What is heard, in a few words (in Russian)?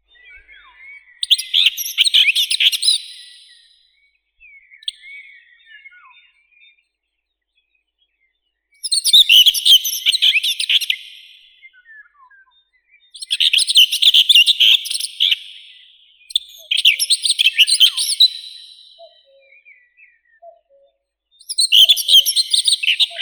slavka seraya sylvia communis, zvuki prirodi